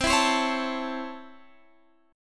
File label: Db Minor Major 7th Sharp 5th C